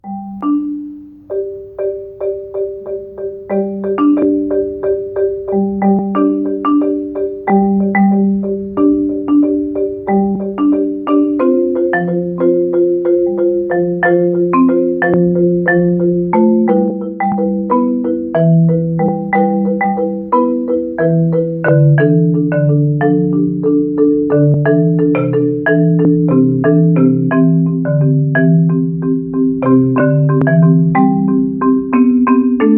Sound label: marimba; improv: Marimba